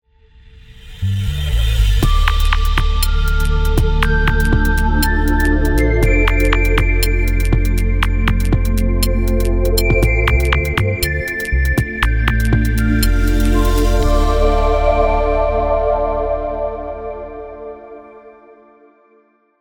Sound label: ambient digital logo music